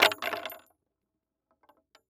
Weapon Bullet Shell 3030 Shell Cases Multiple Cases Fall On Boardwalk; AdobeCC